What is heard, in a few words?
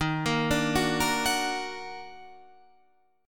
Eb Minor Major 7th Eb down, chord; EbmM7; arpeggiated